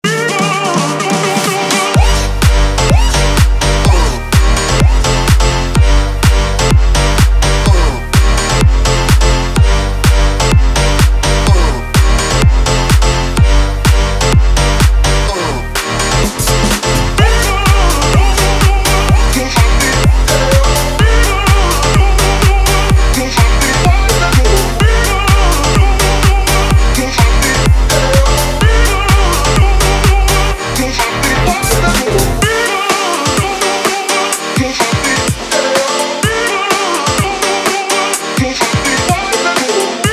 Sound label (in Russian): Отбивка